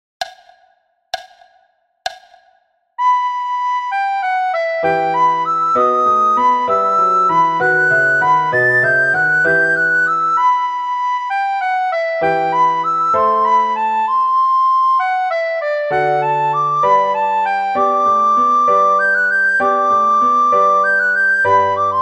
Partita Em sopraan; Telemann Partita 5

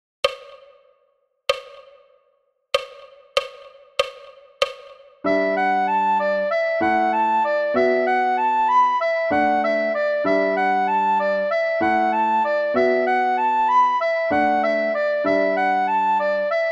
sopraan snel

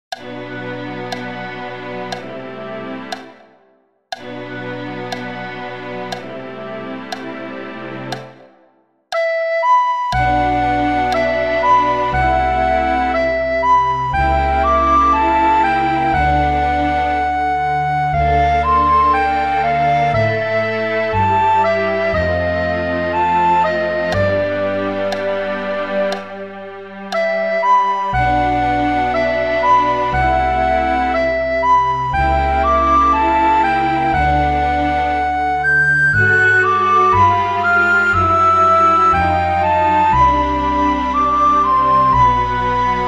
sopraan; Flierefluiter 5

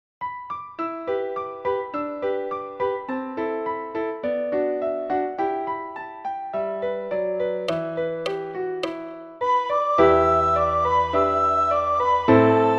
sopraan; Flierefluiter 6

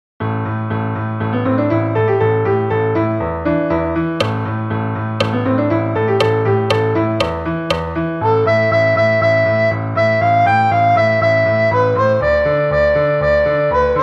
snel alt; Tureluurs 3

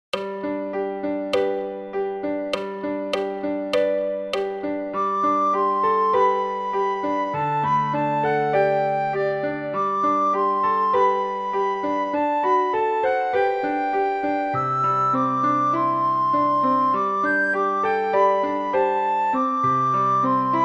sopraan; Flierefluiter 6; Tangled

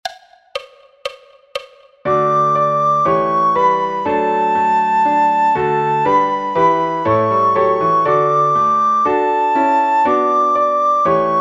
Rigaudon sopraan; purcell; Blokfluitles

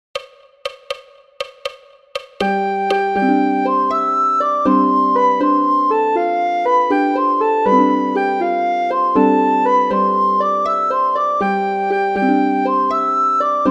sopraan; Flierefluiter 5; the windwaker

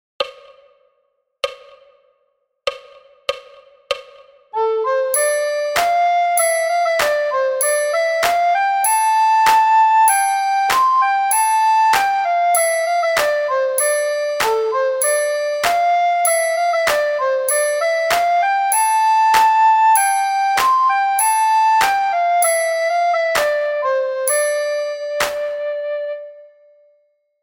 bamboe alt rustig tempo; Tureluurs 3

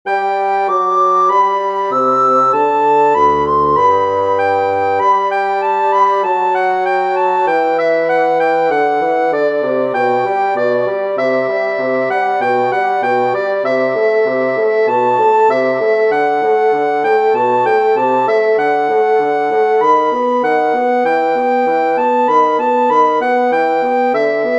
vivace sopraan rustig oefentempo, blokfluit

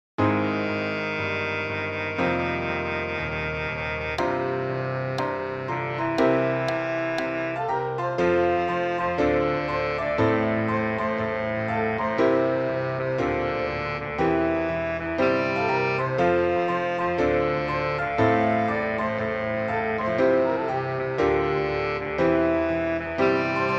sopraan

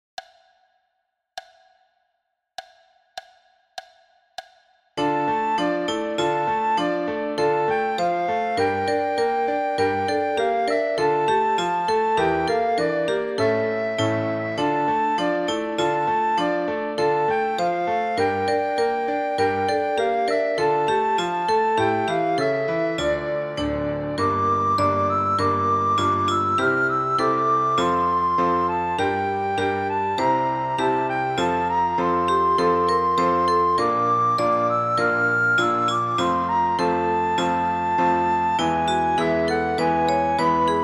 sopraan; Flierefluiter 5

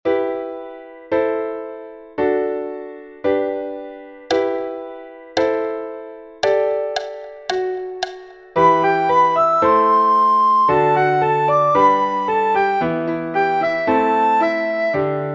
sopraan; Flierefluiter 6

